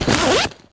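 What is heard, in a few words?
inventory open; STALKER; IWP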